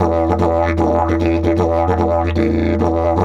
E 150, DIDGE RIFFS

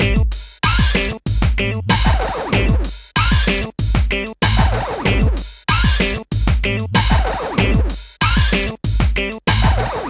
PHATT BEA, Hip Hop

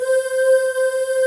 PAN CHOIR; STRING+CHOIR; Pro-Rec; Synthsonic

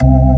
ORG, Organs; Sound & Vision; Gigapack I CD 2; ORG B-3 Organs

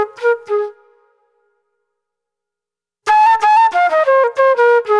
NY 70's Jazz Flute

